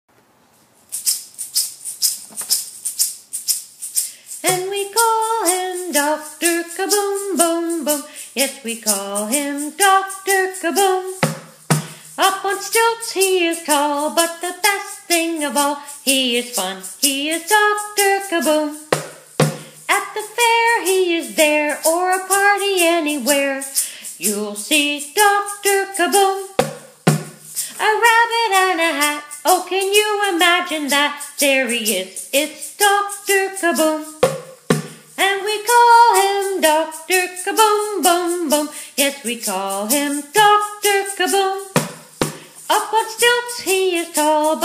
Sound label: theme song